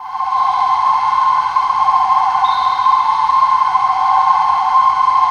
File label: CRIK CHOIR; Spectrasonic Distorted Reality 1; CRICKETCHOIR